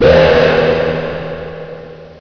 Hit; hl2

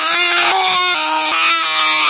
scream loop, fast zombie; hl2

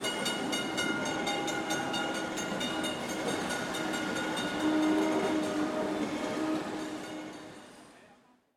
Tram Past with Bell, Hong Kong; amb; Resonant; Sounds of Hong Kong

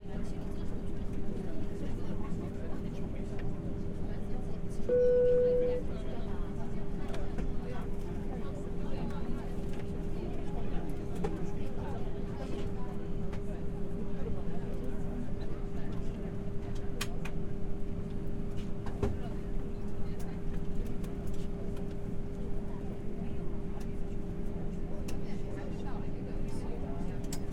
Airliner Voices Luggage Pre Takeoff; Airports & Planes; Hzandbits; EM172-stereo

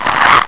vort pain; hl2

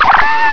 houndeye yelp; hl2

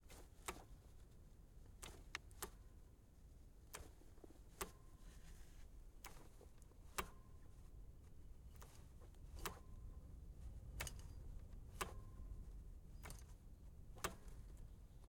Yamaha, WR450F, Brake Pedal, Alternative Side; 2019

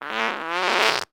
Fart Long; Soundopolis; Burps and Farts

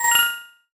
Feedback up; CB Sounddesign

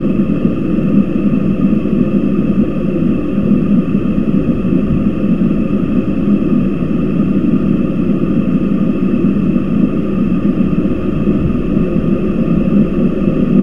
Geofon, DRONE; InMotionAudio; GEODRONE

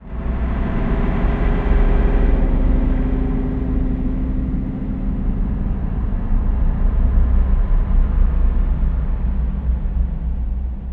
texture, Lunar - Cinematic Ambient Samples; Bluezone

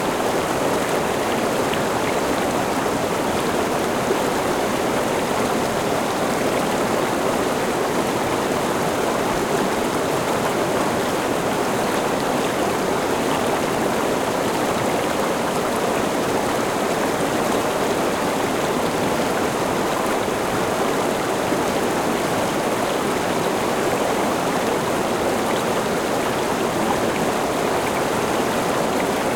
River Rapids; Soundbox Library

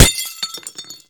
glass, bullets impact; hl2

